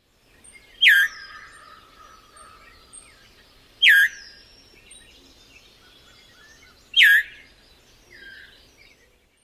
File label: calls, Veery